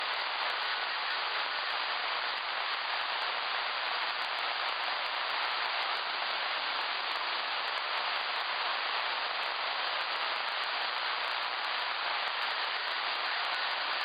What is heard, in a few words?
baseline no noise am 7058; signalink; dealing with local rfi